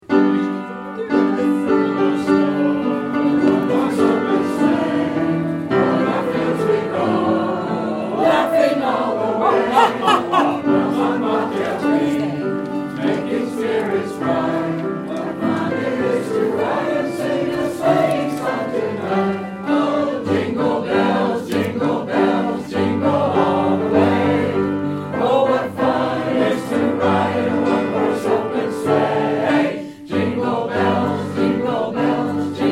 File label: jingle bells; party 2012